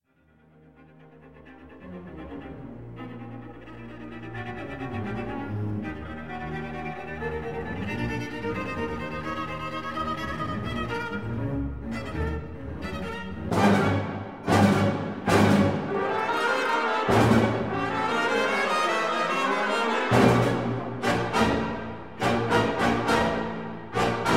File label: Concerto For Viola And Orchestra, Moderato Assai; Symphonic Hollywood